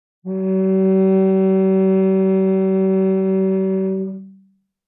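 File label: Cello arco fortissimo sul C Gb2; MIS Pitches - 2014; Strings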